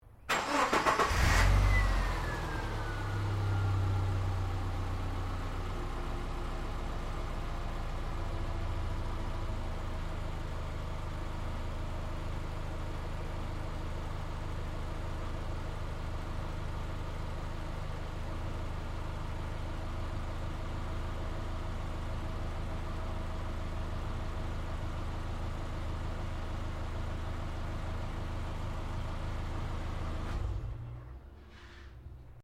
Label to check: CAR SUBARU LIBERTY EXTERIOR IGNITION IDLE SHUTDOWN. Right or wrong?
Right